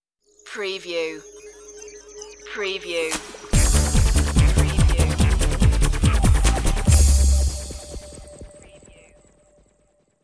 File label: time machine stinger